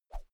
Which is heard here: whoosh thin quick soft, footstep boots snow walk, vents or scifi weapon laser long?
whoosh thin quick soft